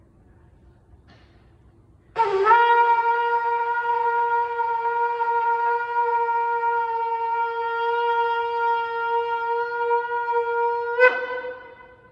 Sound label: Tkiah Gdolah